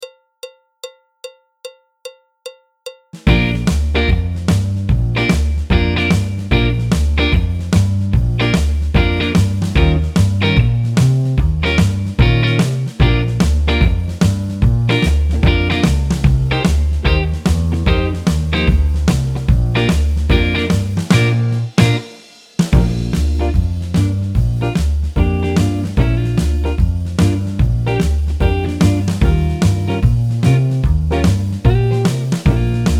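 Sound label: backing track, Jazz; clarinet, flute; Jazz Syllabus Level 3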